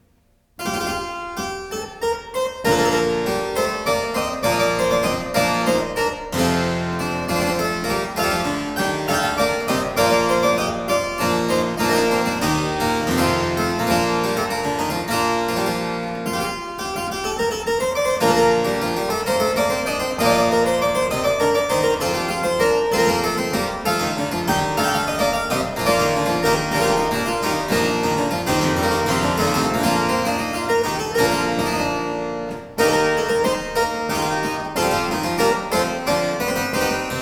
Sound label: Galliard (harpsichord); Byrd